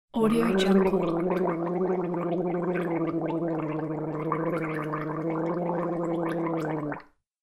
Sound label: female gurgling washing throat